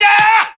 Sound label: "gah", scream; ooh ahh